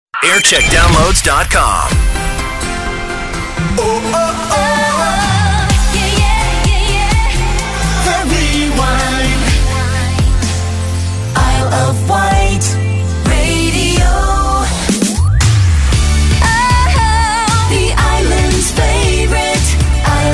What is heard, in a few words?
Jingle Montage; IOW; ReelWorld